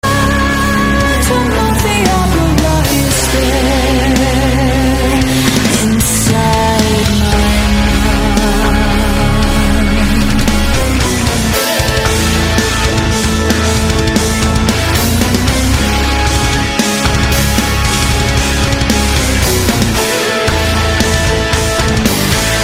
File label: rock ringtone